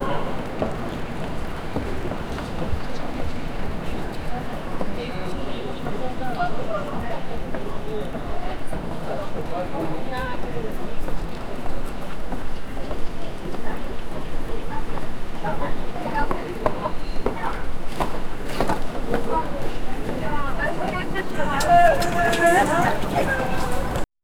people walking moving arou, exterior

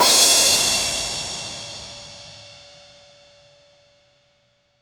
a crash cymbal

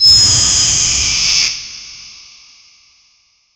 video game sound effect, touch

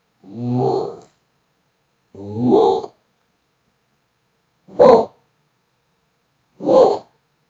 anger sound, frustration

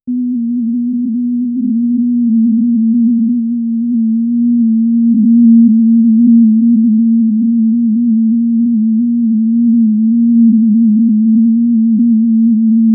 sunny starscout farts brig; bubbly

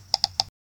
mouse hover over button

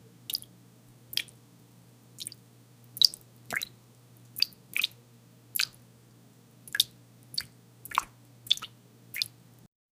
water pouring on carpet

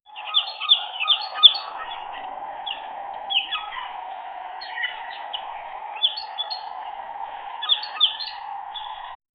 animals like monkeys and, tiger

